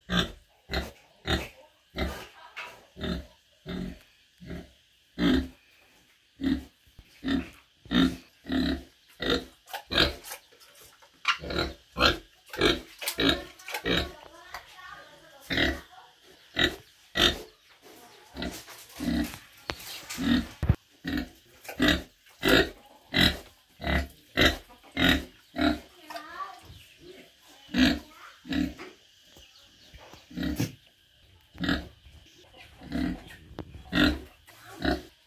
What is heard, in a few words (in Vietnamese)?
Tiếng heo nái kêu